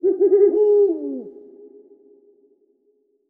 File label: owl; netent